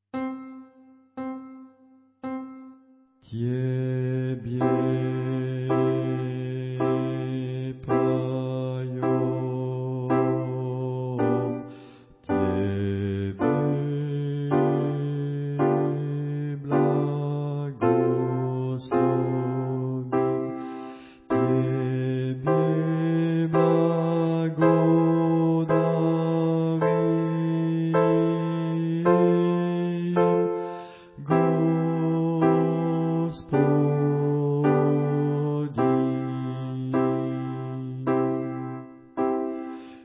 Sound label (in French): alto; tebe poeme